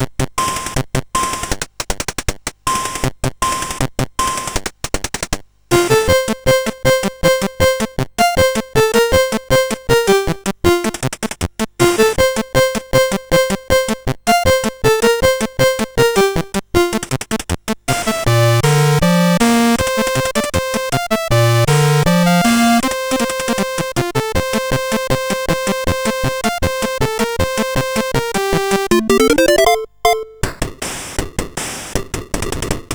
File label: Fireworks Celebration, CSG8580R5; Complete Computer Fireworks Celebration Kit